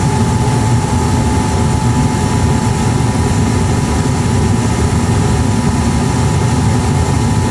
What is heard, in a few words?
furnace, ambience